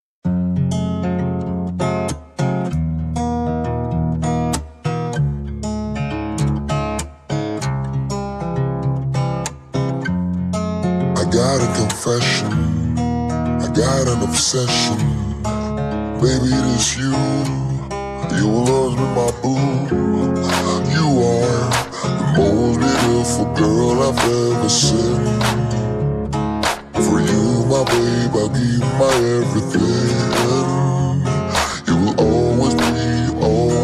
slowed

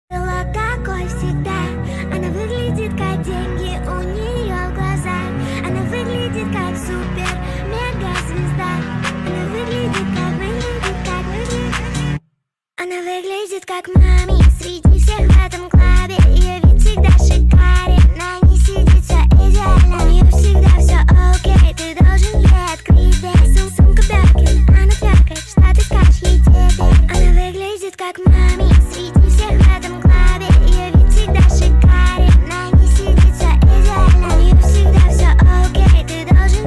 bass boosted; bmw

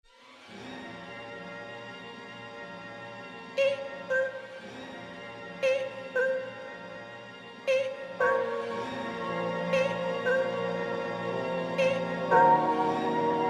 crowd version

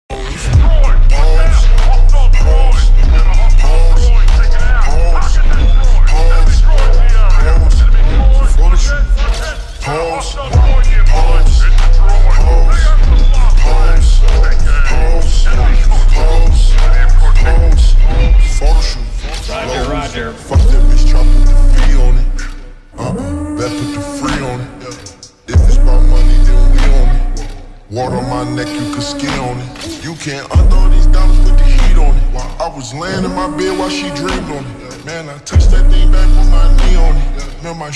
remix slowed reverb

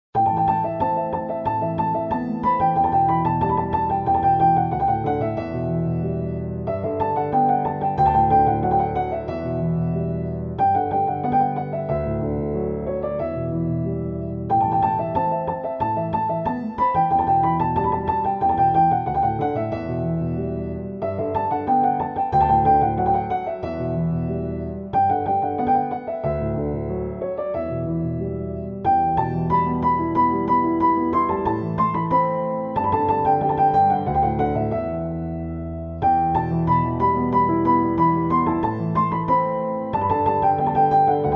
piano; cukur dizi muzigi; piano tutorial

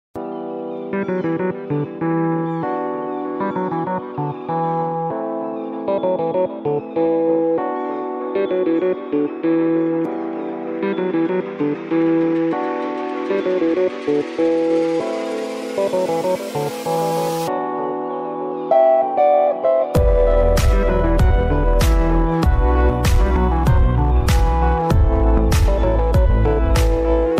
Instrumental Bass Boosted

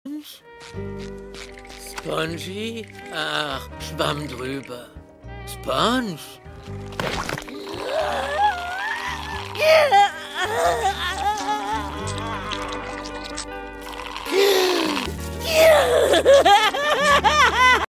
crying; spongebob

